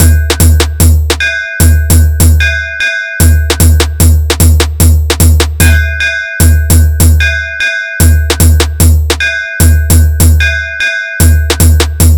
Punch Style Competition Beat Remix

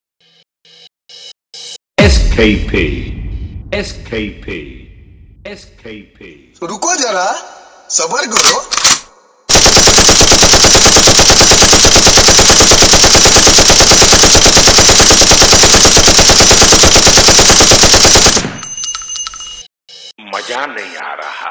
Gun Fire Beat Remix

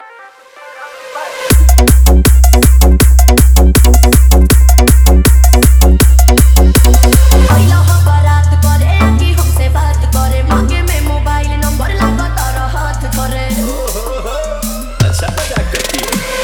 Bol Bam Remix

